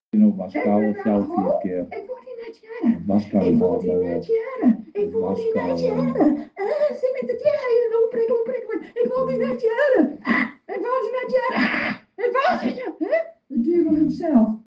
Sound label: demonic rage